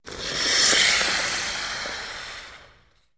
Ice Skating Scrapes

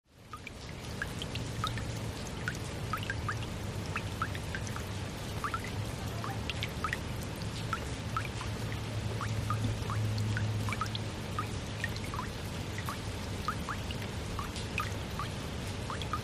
Rain On Porch Heavy

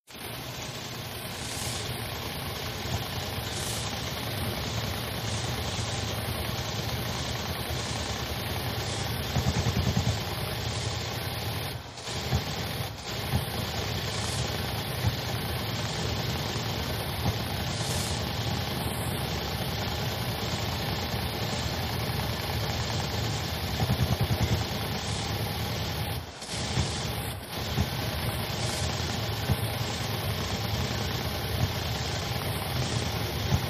Teletype Room BG Atmo

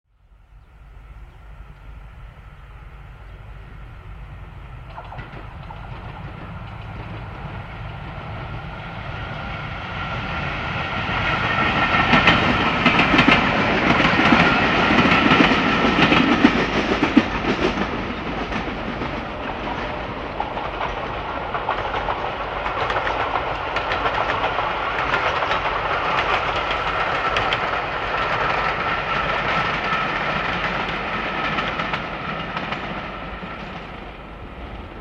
Electric Train Medium By

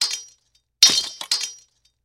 Knife Drops To Ground; knives

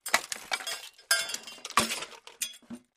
Junk Debris

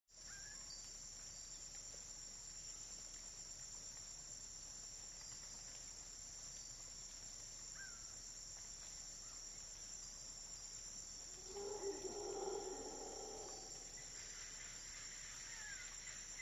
Jungle Ambience; backgrounds

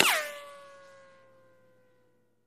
Bullet Ricochet Sin

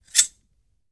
Knife Draws, knives

